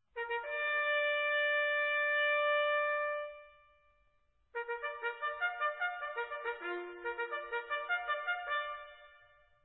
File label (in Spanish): rancho y provisiones, corneta